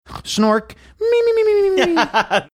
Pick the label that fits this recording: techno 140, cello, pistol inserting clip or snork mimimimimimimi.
snork mimimimimimimi